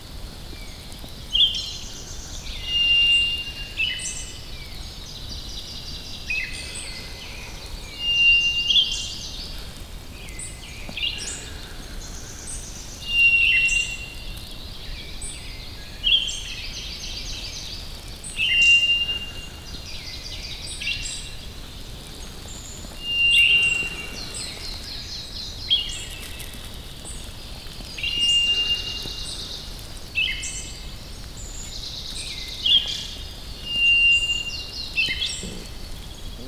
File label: whites thrush wuying heilonjiang china